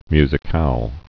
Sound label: musicale